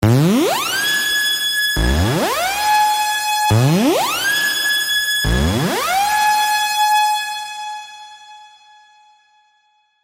FX Loop G# (138 BPM)

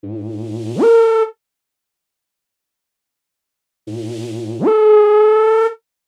Synth Loop A (125 BPM)

